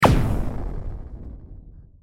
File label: attack